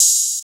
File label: Open Hats